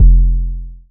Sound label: analog tonal, Kickbank